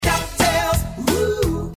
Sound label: duck